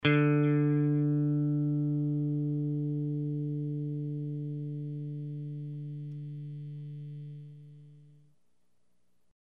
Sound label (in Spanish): guitarra afinar cuerda cuatro